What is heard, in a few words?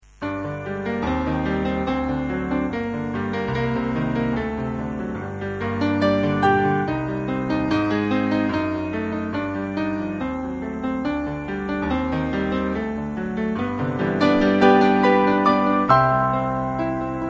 piano classic; Eigen gespeelde melodieën